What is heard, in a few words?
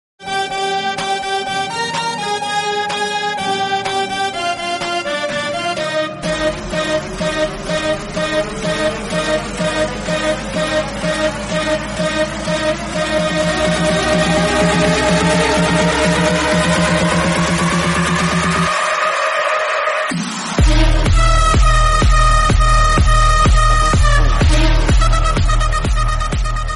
Hindi trance